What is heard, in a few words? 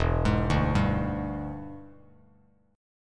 E 7th Sharp 9th E down Bass Standard; E7#9 arpeggiated; JGuitar